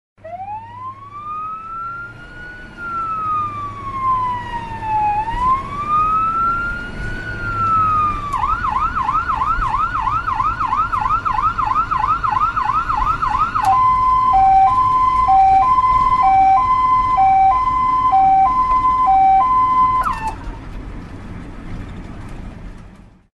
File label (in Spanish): ambulancia bomberos y policia